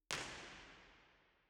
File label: First IR Sala 2800 Genelec Left WY, Soundfield; Santa Cecilia Roma; Measurements 2005